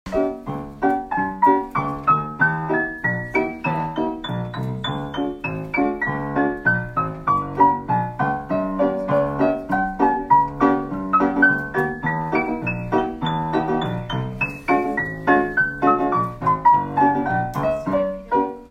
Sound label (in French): IMPROVISATIONS